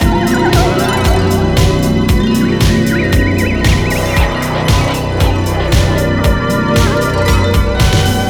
Spooky Breakdown Loop

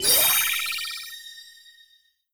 Wave Clear Bonus; disney star smash android ios mobile gamerip 2020

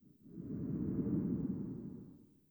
Transition; Google Earth VR

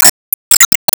Score Minus

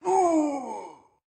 Pain; Caffiend Caelan; Going Under

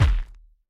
Bounce Warhammer

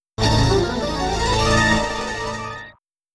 Skill Shot; Scared Stiff; Bally Pinball

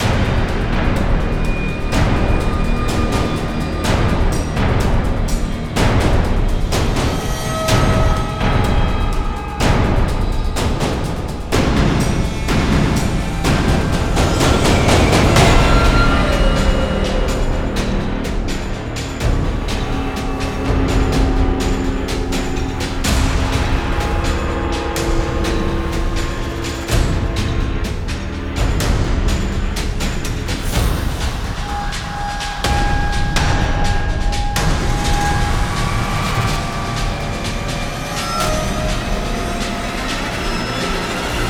Base Loop low

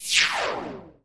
Weaver wall Disappear

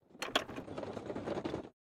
Minecart Enter

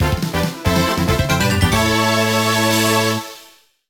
Win!; Tetris Party Deluxe

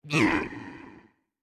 Effort Tiny

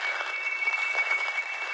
Slip

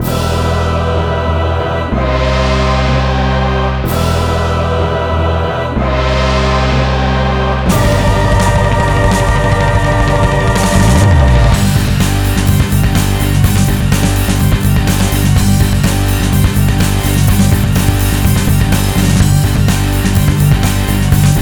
Boss Battle

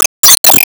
Emo Warcry

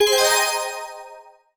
Start

